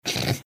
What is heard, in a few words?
snorting